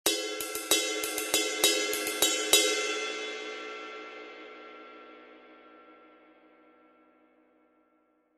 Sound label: 22in Custom Medium Ride, cymbal